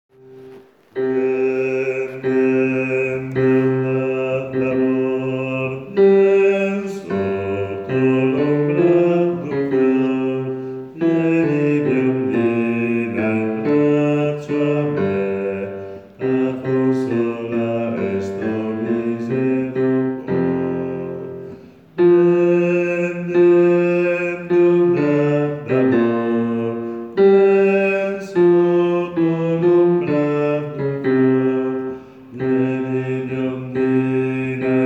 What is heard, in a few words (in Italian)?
bassi; vien bionda